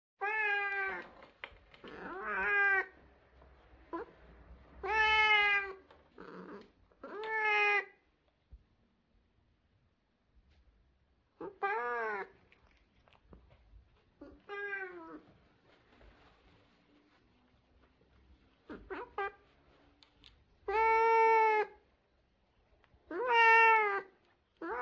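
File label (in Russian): мяукает сиамская кошка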